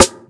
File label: snare; daily samples